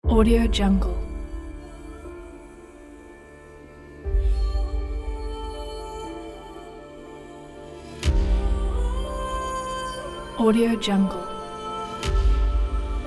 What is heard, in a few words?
discovery investigation cluster trailer